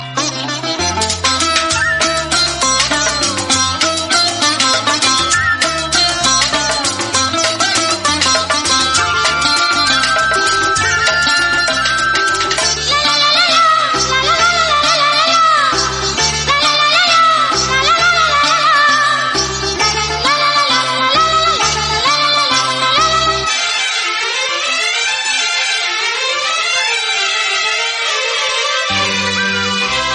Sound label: tune